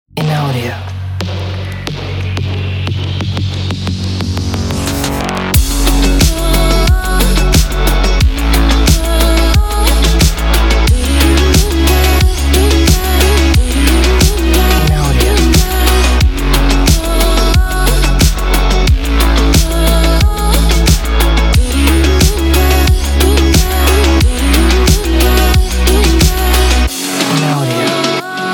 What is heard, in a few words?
Synth Wave; Oddvision Media; Infraction Music